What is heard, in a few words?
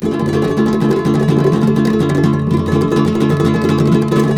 D# M, Guitars; Spectrasonics; Hans Zimmer Guitars VOL-2; FLAM TREM CH